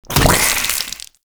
Ghoul Devourer Hook Tongue Spit; Warframe; Grineer Ghouls

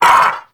Vocal Set Pain; Warframe; Duviri; Dax

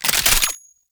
Lato Reload; weapons; Warframe

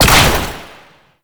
Ranged Weapon Fire; Warframe; Necramech; Weapons

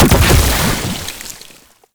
Whip Proc Explosion; Oraxia Whip

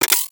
Briefcase Akimbo Pistol Reload Passive; Warframe; Corpus

